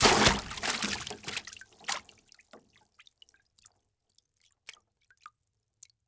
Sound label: Liquid Water Water Splashing In Plastic Tub